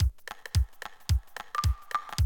Classic DrumLoops, 110

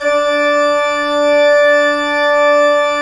ORG, CHURCH ORGAN; Roland - LA Composer vol. 1

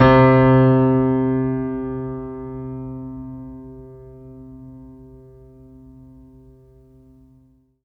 C3, SynthLine; 90 s Sample CDs; NiceBeats; SynthLine VOL-22

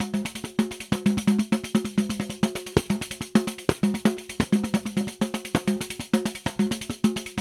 Rio Batucada, 130 bpm

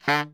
BARI FF D 2, Saxophones; Greytsounds - Philip Wolfe David Crozier's Saxophones; BARI SHORTS

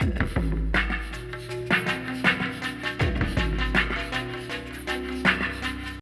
Droopy, Drum Loops, Processed; Zero-G Smoke

